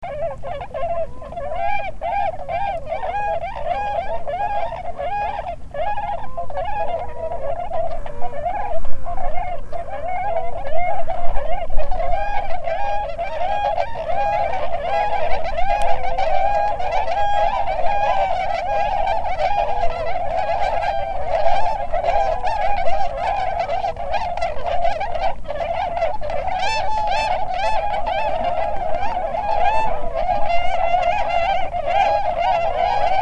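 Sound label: tundra swan; kohakucyou; sibayamagata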